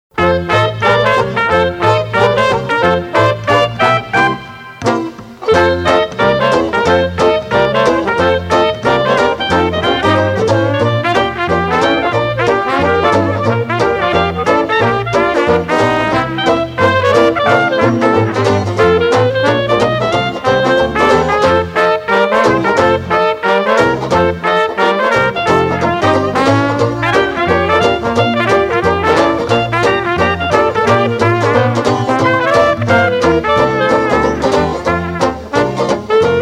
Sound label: Blues, Dixieland Jazz Band; 20s band